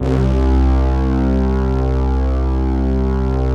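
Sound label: synth brass c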